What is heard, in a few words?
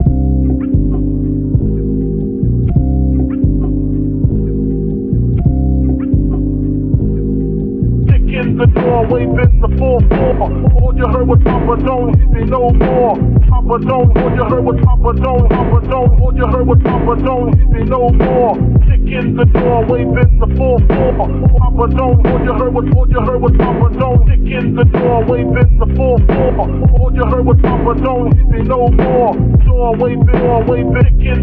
kick